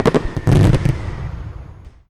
twinkle, fireworks; Minecraft; Conquest Default

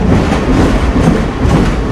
base, minecart; Minecraft; Votive World

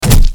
crit, player attack; Minecraft; Votive World

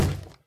wood, zombie; Minecraft; Votive World

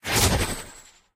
portal teleport; Minecraft; Votive Content

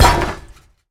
anvil land; Minecraft; Conquest Default

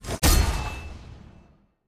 enchant, enchantment table; Minecraft; Votive World

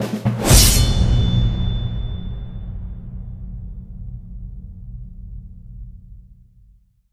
challenge complete, ui toast; Minecraft; Conquest Default

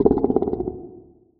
guardian ambient; Minecraft; Votive World

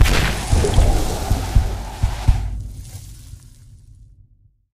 remedy, zombie; mob; Minecraft; Conquest Default

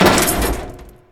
repair, iron golem; mob; Minecraft; Conquest Default

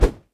player attack weak; Minecraft; Votive World

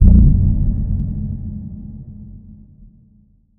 moan, ghast; mob; Minecraft; Conquest Default

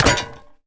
break; Minecraft; Conquest Default

